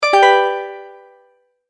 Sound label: multimedia tone, Goodbye; Tones; Sound Design; McKinney Sound